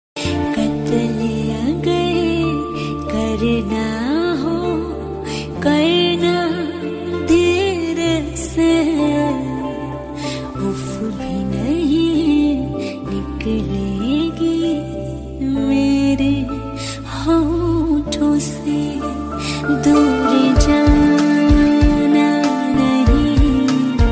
Female Version Ringtone

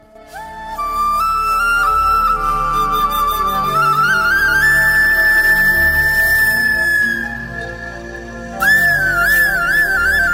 Flute Love Ringtone; ringtones

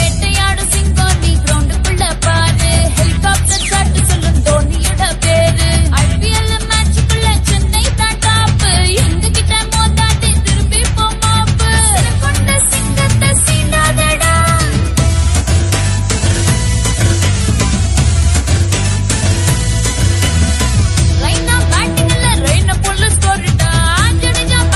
Tamil Ringtone; Vivo IPL 2019 Chennai Super Kings

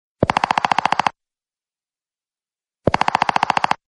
SMS Ringtone; Nothing Phone 2

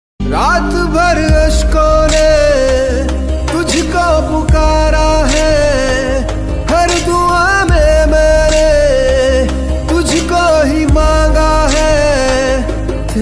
Sad Hindi Song Ringtone

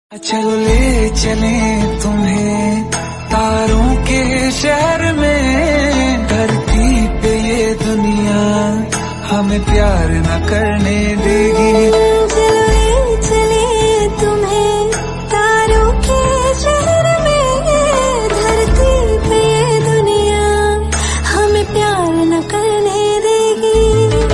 Romantic Hindi Song Ringtone

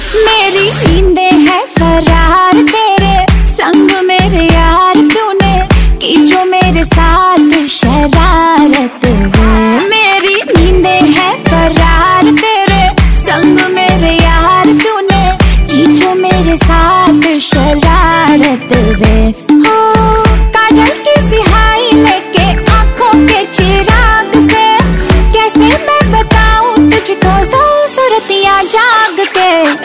Bollwood Ringtone

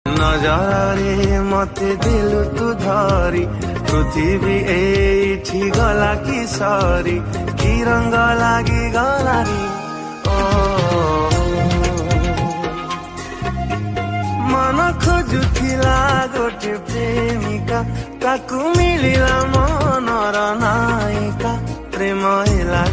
Odia Ringtone

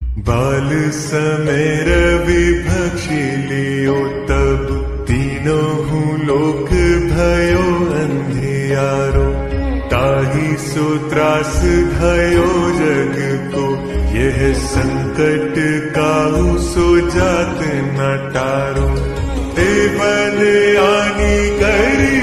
Hanuman Ashtak Slowed Reverb Bhajan Ringtone

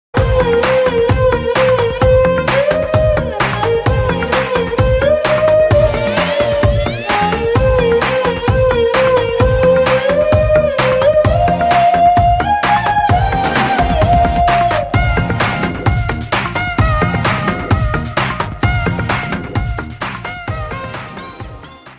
BGM Ringtone

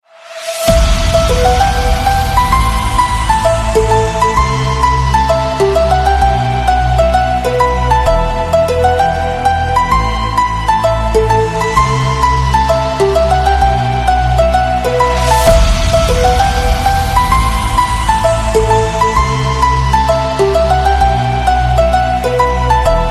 Android Mobile Ringtone; ringtones

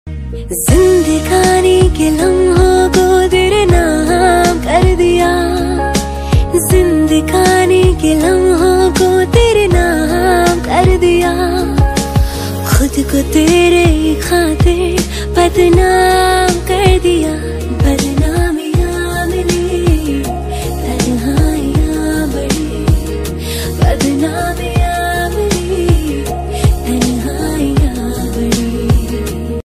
Romantic Hindi Song

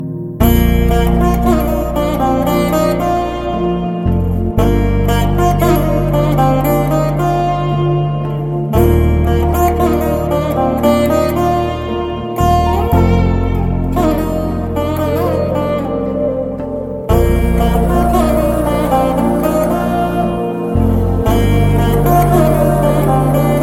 Bgm Ringtone; Tholi Prema

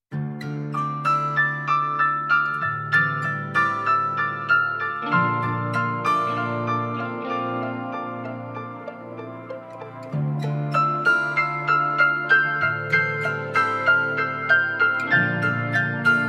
Nice Solo Music Ringtone

